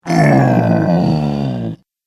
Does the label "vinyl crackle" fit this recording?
No